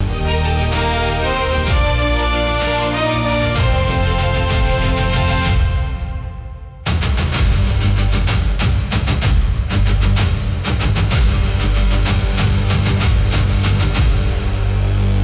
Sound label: loop